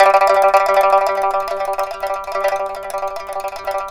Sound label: PIPA TRL G2; INIS; Opium